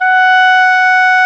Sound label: Clarinet; Roland LCDP04; 90 s Sample CDs; Clar Cls